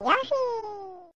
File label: Yoshiiiii